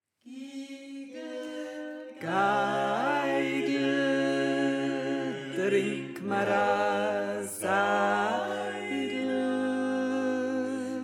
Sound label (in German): Tenor